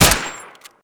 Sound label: STEN MK2